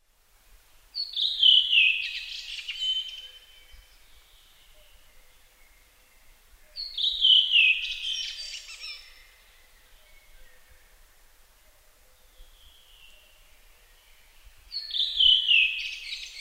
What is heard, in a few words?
redwing